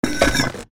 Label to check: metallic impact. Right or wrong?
Right